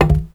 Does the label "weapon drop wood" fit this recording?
Yes